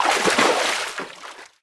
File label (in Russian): ledyanaya volna priboya